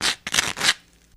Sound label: Toast Scratch Both Wa